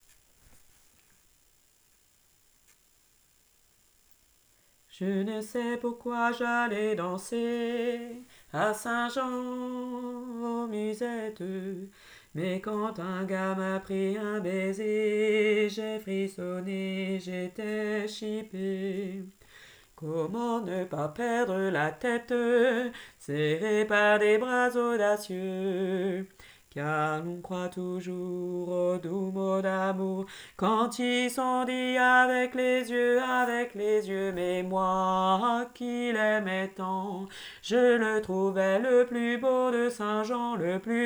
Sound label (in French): ténor